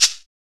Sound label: PRC CAXIXI